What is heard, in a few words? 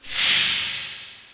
hiss; XDM; ambience